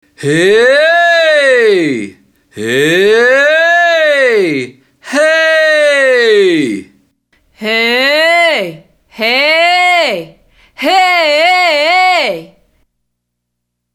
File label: Exercise 4 Hey